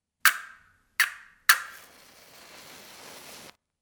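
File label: match sound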